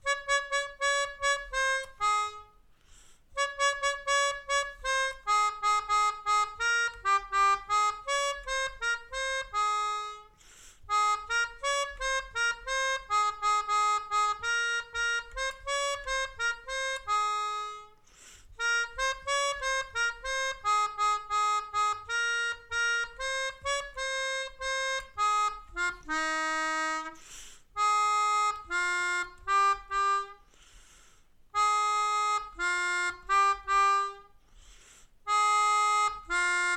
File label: La Bamba, Melodica